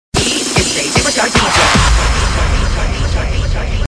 Hardtyle Sms

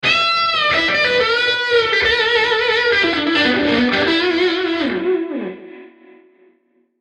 Guitar blue phrase

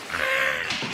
screaming; marge

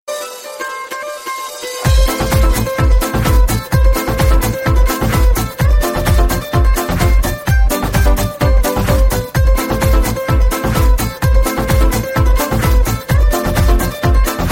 Best Dance Guitar

